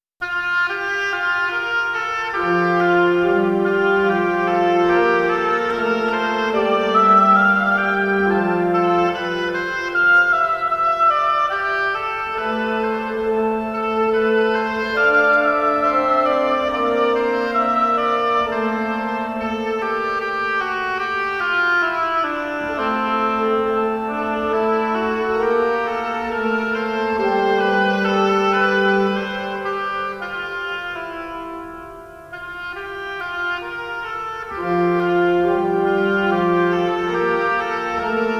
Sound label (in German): Variationen - Oboe; Variationen über Der Mond ist aufgegangen